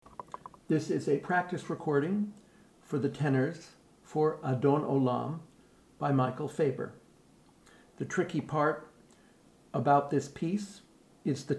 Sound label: Adon Olam tenor; Faber